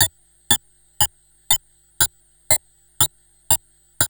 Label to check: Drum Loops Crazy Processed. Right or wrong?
Right